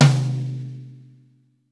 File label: V-Pop Tom 47, Roland BK-5 Toms